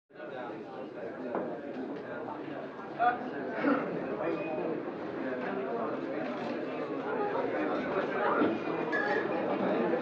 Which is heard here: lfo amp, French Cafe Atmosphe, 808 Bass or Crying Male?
French Cafe Atmosphe